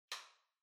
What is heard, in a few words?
Light Switch American Toggle Far Hall Mid